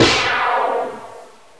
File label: plasma